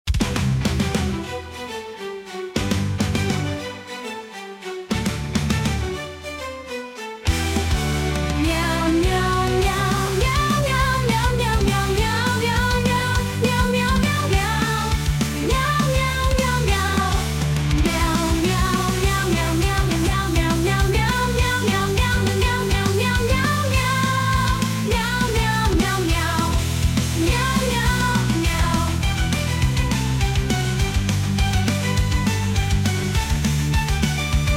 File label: Meow Melodic Metal; MusicBoxAI